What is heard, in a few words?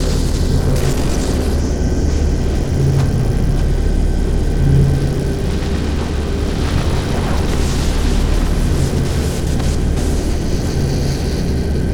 machine amb loop